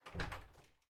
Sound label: Door Start Open; Game rip; MiSide